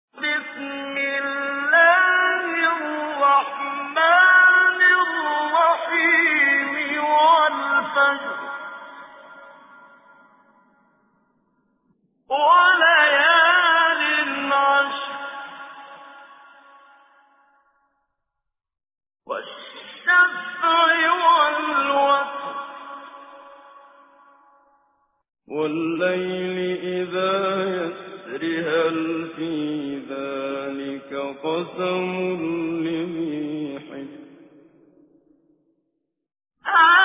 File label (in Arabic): سورة الفجر, تجويد; المقريء الشيخ محمد صديق المنشاوي